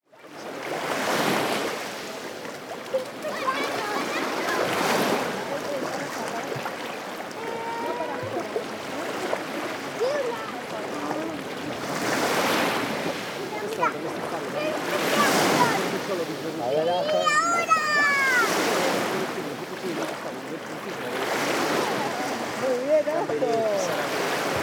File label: Sea, Mediterranean sea, small beach ambience, light waves, people and kids; Baia Ostina; lilesound